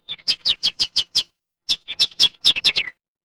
animal bird

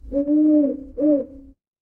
animal owl

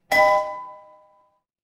door doorbell

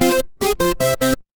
retro game game over

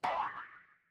cartoon bonk

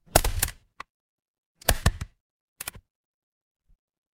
office stapler

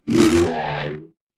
cartoon stretch

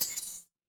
feedback success

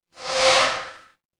ui swipe

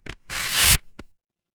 paper fabric tear

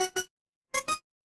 feedback start

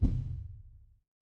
impact thud

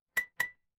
ui alert

